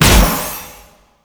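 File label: Kunai Projectile Explosion, Weapons; Warframe; Choir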